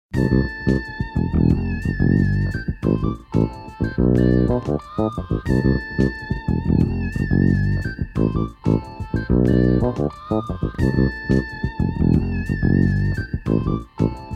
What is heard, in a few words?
4 Am bpm 90